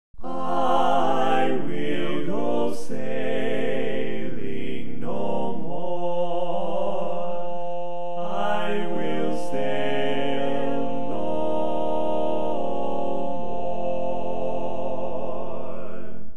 Tenor, barbershop tags

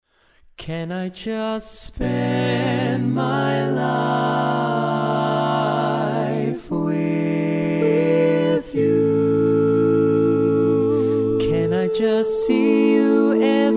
barbershop tags, all parts

